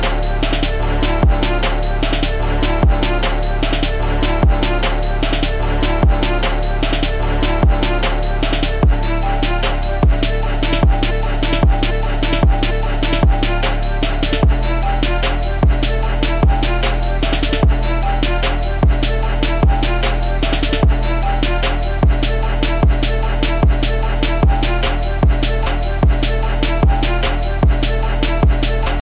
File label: iphone xr ringtone